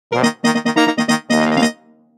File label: Award Fanfare; Animal Crossing New Leaf